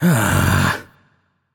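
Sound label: nonverbal ugh; voicepack; smite; merlin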